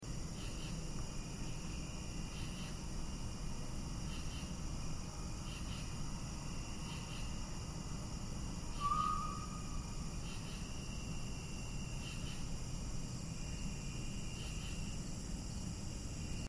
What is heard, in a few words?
possible whistle